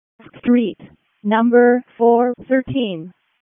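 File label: EMS ALERT, radio; wake; p25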